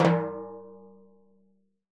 TIMB HI, Synclavier Percussion, World & Orchestral; ILIO; Synclavier Percussion 2